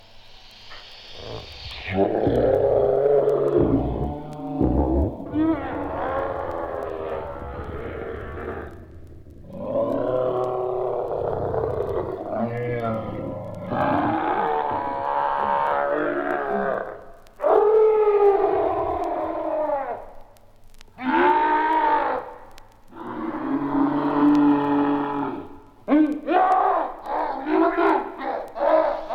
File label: monsters roaring; vinyl sample